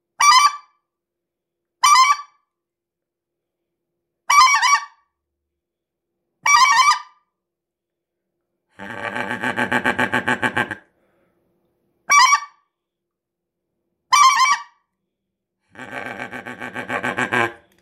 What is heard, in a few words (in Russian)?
гуменник комби звук